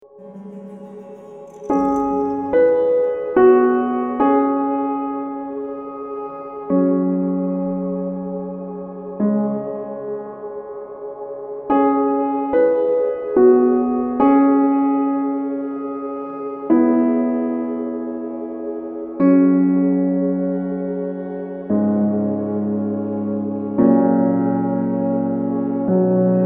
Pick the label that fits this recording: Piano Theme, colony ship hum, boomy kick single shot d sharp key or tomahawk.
Piano Theme